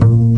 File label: Upright Bass Notes